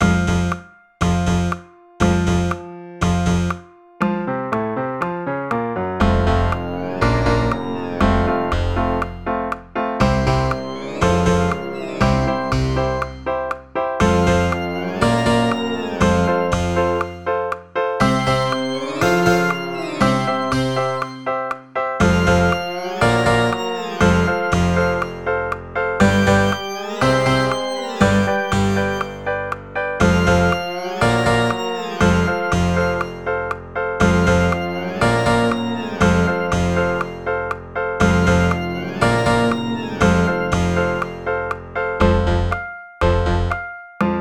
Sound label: range bass; bass trombone; Range Stretch; Tempo = 120; First Habits Warm-Up